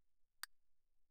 castanet, mf; Percussion; hand percussion; castanets

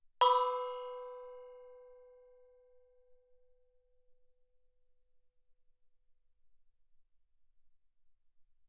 thai gong B4 ff; thaigongs

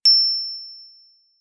bells brass ff E8; Percussion

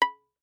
Violin pizz ff sul E D6; MIS Pitches - 2014; Strings

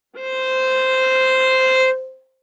Viola arco ff sul G C5; MIS Pitches - 2014; Strings

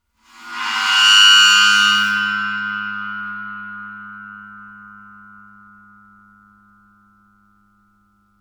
chinese bow, Suspended Cymbals bowed; Percussion

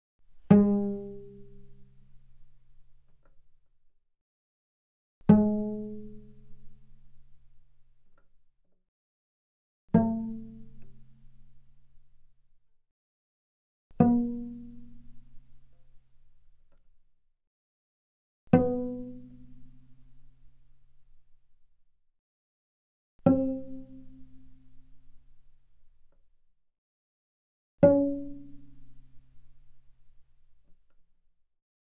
Cello pizz ff sul G G3 G4; mono; Strings

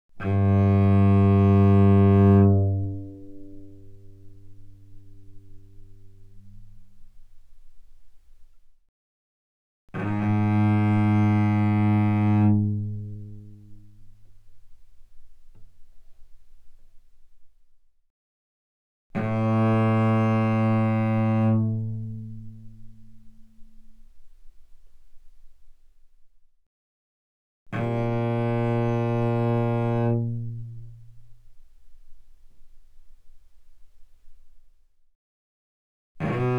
Cello arco ff sul G G2 Gb3; Strings

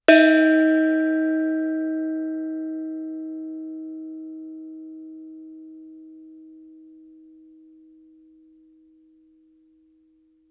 thai gong ff Eb4; MIS Pitches - 2014; Percussion

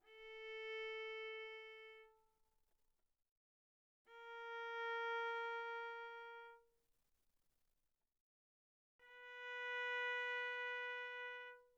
Viola arco sul A pp A4 B4

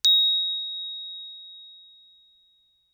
bells plastic ff Bb7 stereo; Percussion

